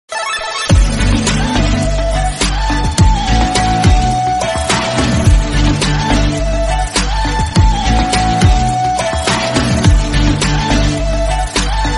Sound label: instrumental ringtone